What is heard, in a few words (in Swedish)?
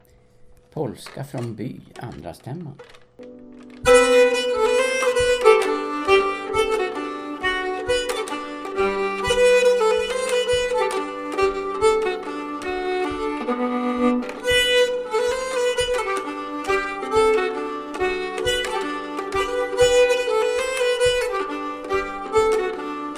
Polska stämma